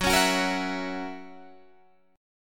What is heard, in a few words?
Gb 7th Gb down Guitar Standard, chord; strummed; JGuitar